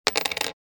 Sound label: Dropping a coin on a table